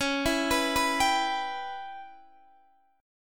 C sharp Minor 7th Flat 5th, Guitar DADGAD

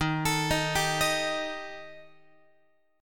Eb Major Flat 5th Eb down Guitar; EbMb5 arpeggiated; x,11,12,13,11,14; 4A,4E,5A,5D,5G#,6C#; JGuitar

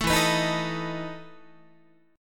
E Major 7th Suspended 4th E down Guitar; 12,12,14,13,13,14; 4A,4E,5A,5D,5G#,6C#; strummed

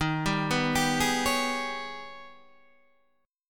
G sharp Minor Major 11th D sharp

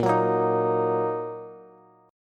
A sharp Suspended 4th Sharp 5th A sharp down Bass; strummed; JGuitar

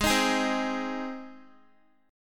G sharp Major, Guitar; strummed; x,11,13,13,12,x; 4E,4A,5D,5G,5C,6F

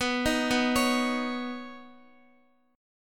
B Major Add 9th B Guitar; arpeggiated; x,x,11,8,11,9

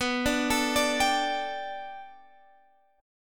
G Suspended 2nd B, Guitar; G2/B arpeggiated

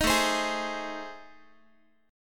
A Minor Major 7th Flat 5th, Guitar; AmM7b5; strummed; 18,17,15,18,x,x

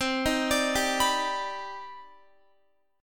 C Minor Major 9th C Guitar 4F,4A,5C,5G,5C,6E; CmM9; arpeggiated

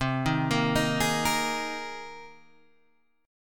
C Minor 7th Sharp 5th C; Cm7#5 Chord {8 6 8 8 9 6} arpeggiated

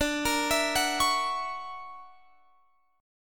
D Augmented Major 9th down Guitar Standard; D+M9 arpeggiated; JGuitar

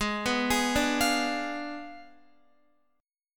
G sharp Diminished 7th G sharp, Guitar; G#dim7 arpeggiated; JGuitar

